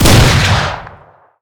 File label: fire, m16 weapons